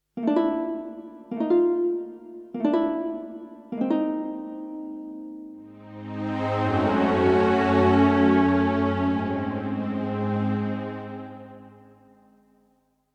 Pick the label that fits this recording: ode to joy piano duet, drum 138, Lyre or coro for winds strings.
Lyre